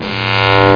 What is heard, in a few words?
transform; ArTKanoid